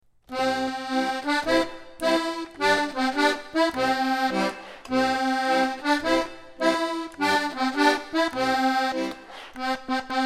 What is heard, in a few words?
button box alouette